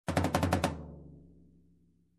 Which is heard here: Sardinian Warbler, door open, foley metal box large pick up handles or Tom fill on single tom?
Tom fill on single tom